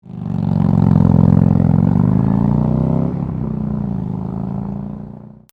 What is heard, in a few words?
TAKEOFF, motorcycle; Exhaust Sound; Vance & Hines 4" Twin Slash 2-Into-1 Slip-On Muffler For Harley Dyna 2012-2017